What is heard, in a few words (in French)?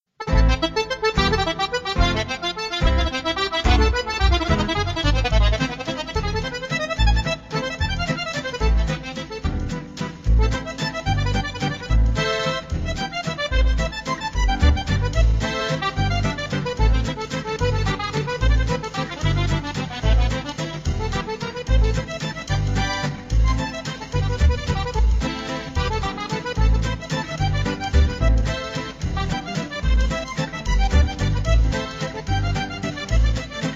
karaoké; Comment décrire une ville en musique?